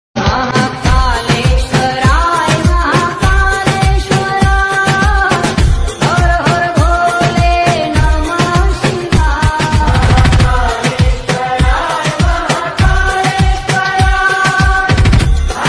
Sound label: lord shiva ringtone; maha kaleshwaray; shiv shankar